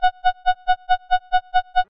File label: space hospital alarm; Alarm Sounds